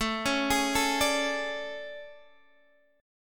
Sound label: A Major 11th, down Guitar Drop D